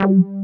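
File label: RESO PLG bass, JV 1080; Pro-Rec - Synthsonic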